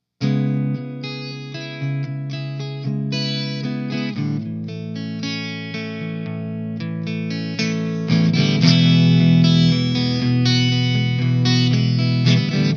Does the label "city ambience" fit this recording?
No